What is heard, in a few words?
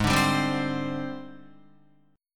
Ab Major 7th Flat 5th Ab, down, Guitar; strummed; JGuitar